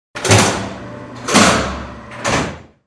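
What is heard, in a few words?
clock; zvuk zasova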